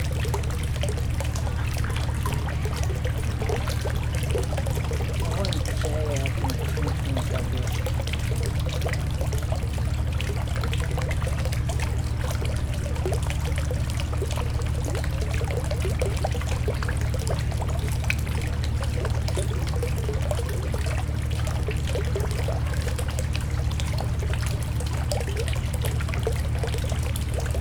csobogo morpalota

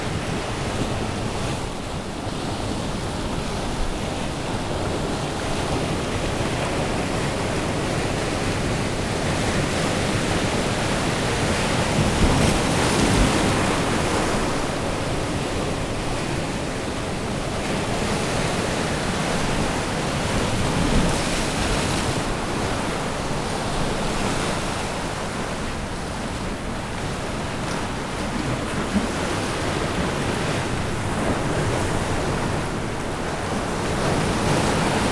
közelebb a kikötőhöz, ocean; orzola

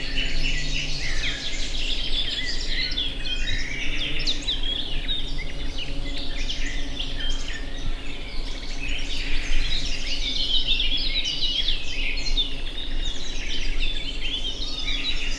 madárhangtól hangos liget; allathangok; termeszetben

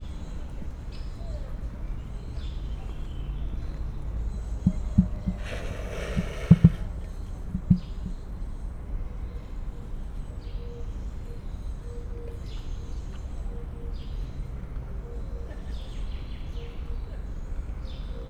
emu; szegedi zoo 2011

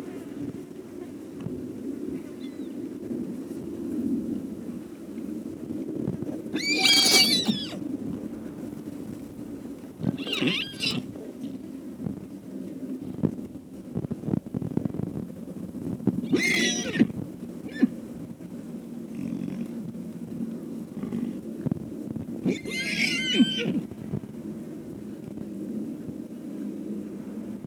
éles nyerítés, vadló; Hortobágyi Nemzeti Park; pentazug

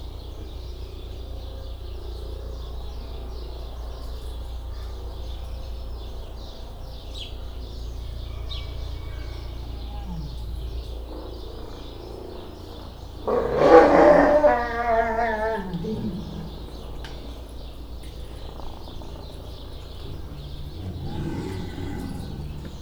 etetésre várva, örvös medve; debreceni zoo 2012; marantz puska

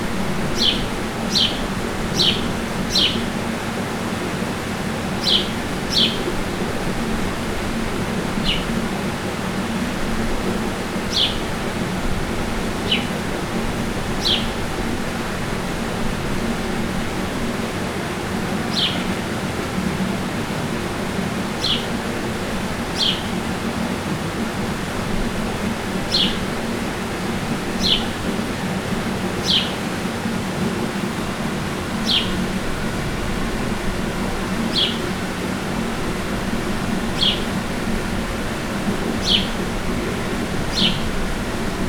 szolo, hazivereb; termeszetben; potamia

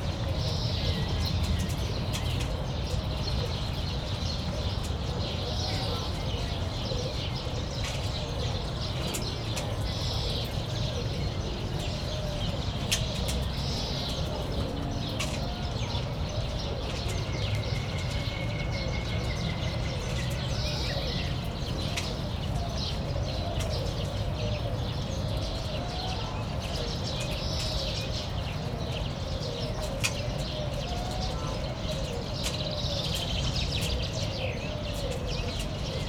csipegetnek a páva eledeléből, házi veréb; debreceni zoo 2012

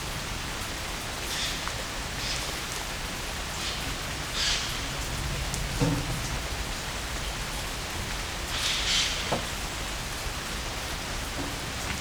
eso, szajko; egerturistahaz; allathangok; termeszetben

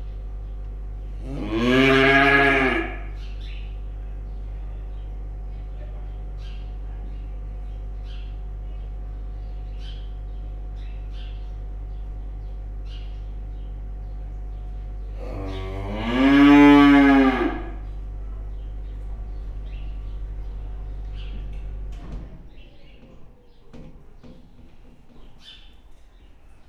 5 hónapos bika hűtőkamra, őstulok; Hortobágyi Nemzeti Park

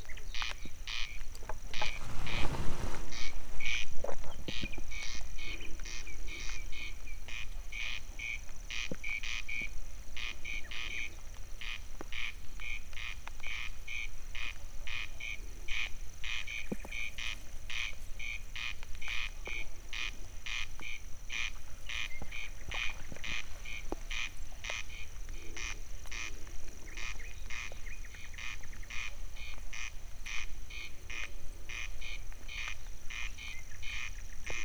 távolban és közelben csepegő eső, haris; Teresztenye

